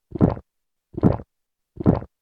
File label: drink